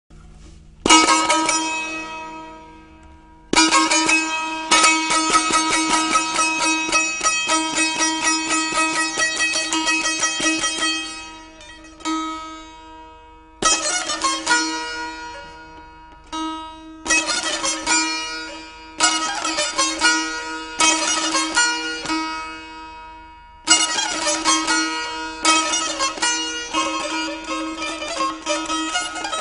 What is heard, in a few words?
Komuz